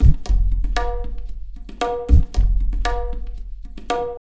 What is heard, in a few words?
Tabla, 115 BPM; Zero-G; Pure Tabla